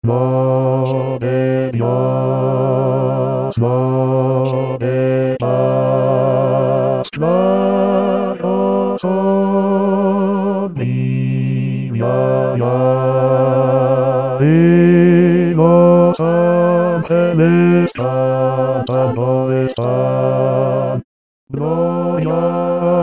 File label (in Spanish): noche de paz bajo